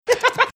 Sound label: short laugh